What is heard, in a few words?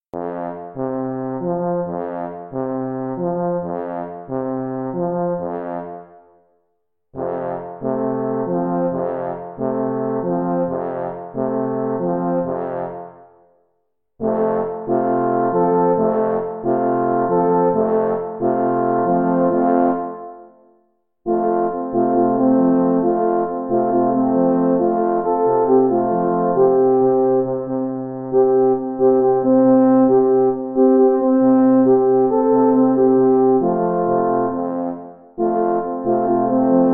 Latin Alps